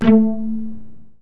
A2 PIZZICATO; ORCH.COMP

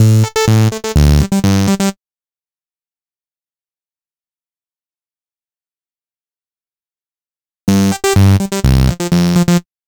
Bass, Afterhours EDM, Electro Shock

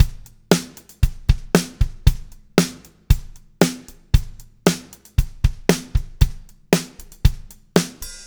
MED POP, Backbeat, R

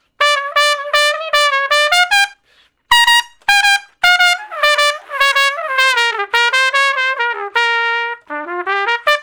Trumpet Shuffle (G); Solos

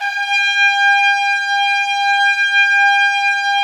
BRS TPS, Trumpets; Roland - LA Composer vol. 4

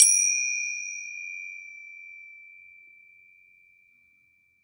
bells, bowls; Best Service ProSamples vol.52 - World Instruments 2; AKAI

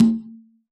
latin kit conga

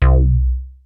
FM PSEUDO, mono poly; TECHNO-BWARE

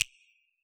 finger snap, Yamaha DJX Rock Kit

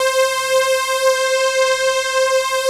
VS strings; Greytsounds; Scott Peers Sound Engineering

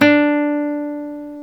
GTR Nylon, Roland

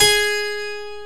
HARPSICORD

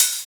Open Hhat, HiHat; Equipped Music; Loungin House

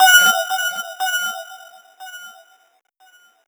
Breakdown FX, Underground UK House & Electro; Sonic Foundry (Sony Creative Software)

